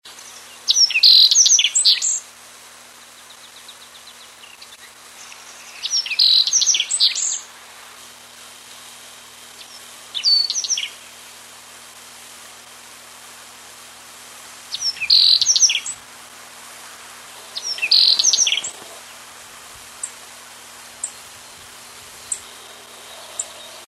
Espodocephala, birdsong

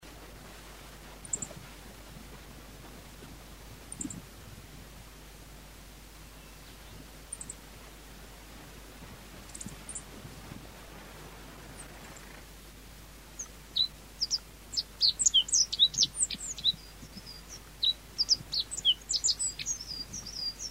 Ecioides, birdsong

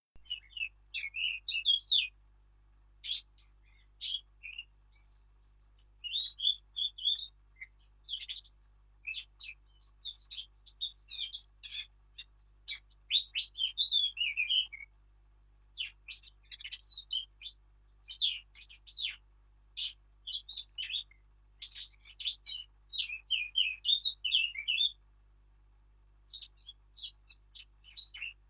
Sylvia atricapilla

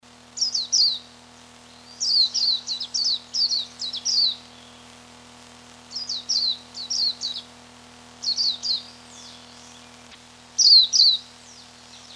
beloglazki, birdsong

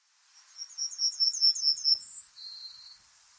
birdsong; Изучаем песню овсянки обыкновенной

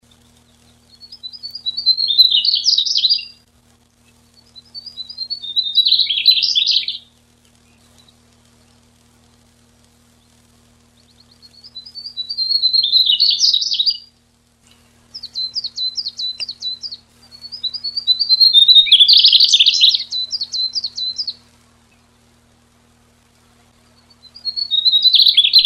mugimaki, birdsong

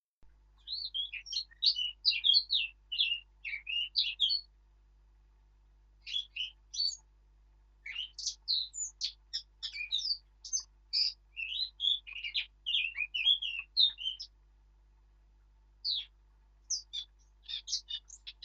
Sylvia atricapilla

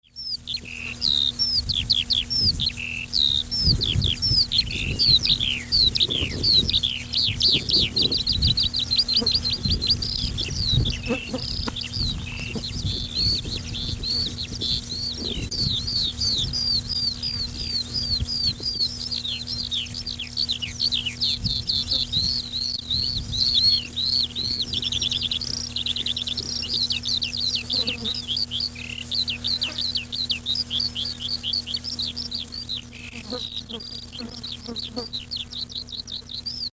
gavoronok, birdsong

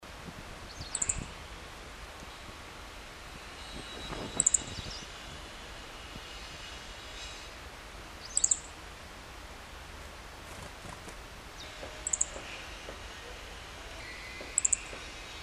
E cioides; birdsong

